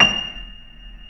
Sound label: Keyboards Acoustic, F6; USB Soundscan vol.17; AKAI